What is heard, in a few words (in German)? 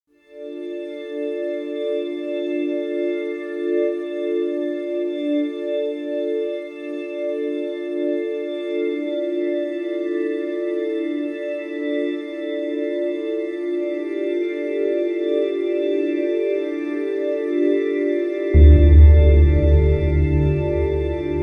Majestätisch